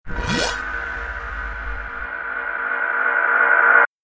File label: Vortex